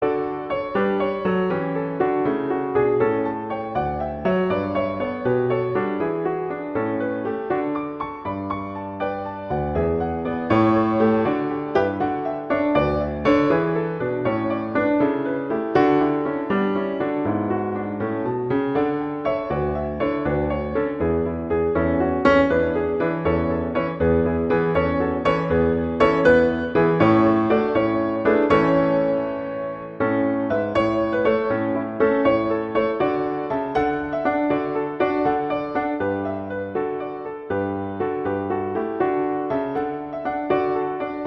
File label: Gigue; Pachelbel; Canon; Piano